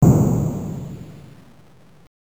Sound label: Explosion; Namco Classic Collection Vol. 2; arcade; Namco ND-1